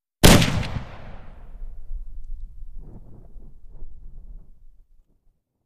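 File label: WW1 Mauser Anti Tank Rifle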